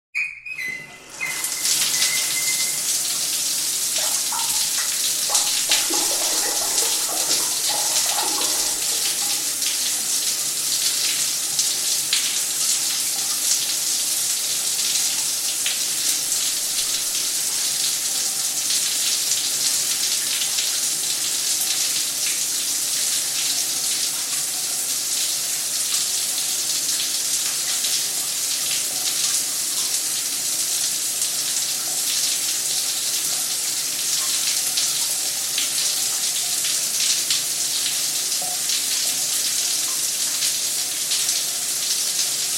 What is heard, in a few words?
Shower sequence; SOUND EFFECTS1